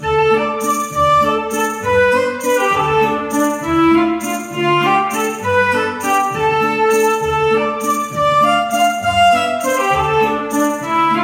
game music; HungryNumber; Fun games

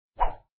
pitch throw, baseball; Sports Games; BaseballClassic

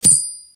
star sound; BoatRush; Racing Games

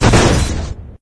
explosion sound; BoatRush; Racing Games

